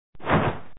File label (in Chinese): 跳; Samurai vs Ninjas